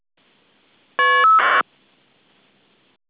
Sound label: FSK